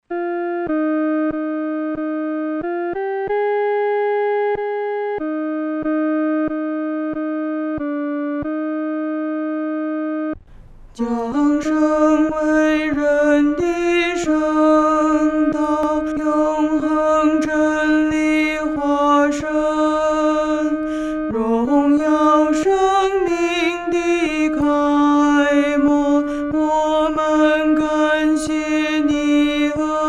圣道化身 独唱（第二声）

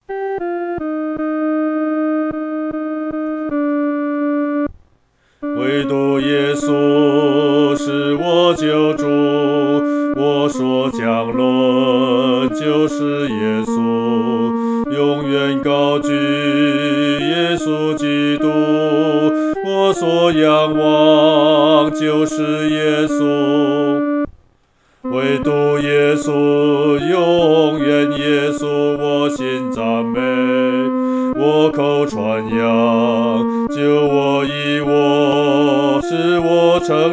独唱 第二声

